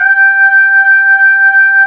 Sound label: SYN VOX G4; AKAI S-Series CD-ROM Sound Library VOL-8; S-PAD